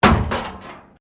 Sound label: METAL BIN IMPACT PLASTIC CRASH BARRIER